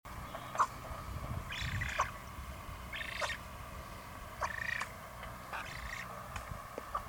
Spoonbill

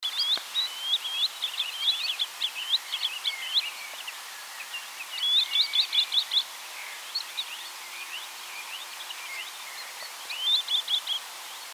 Green Sandpiper

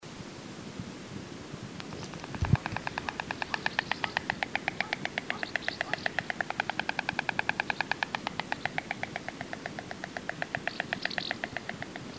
White Stork

